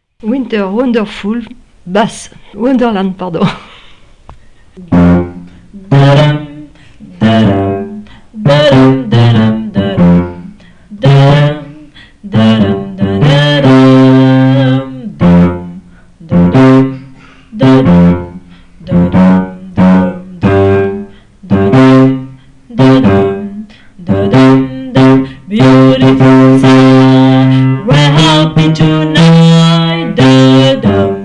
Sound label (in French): Basse